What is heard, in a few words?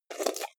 jar plastic midsize open fast